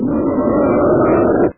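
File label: monster single distant short noisy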